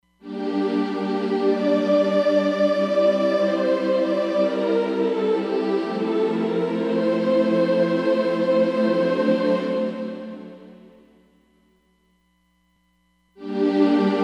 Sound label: Chorused Gate, Roland DEP-5; Factory Patches